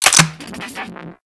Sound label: Shock Rifle Mech, Weapons; Warframe; Corpus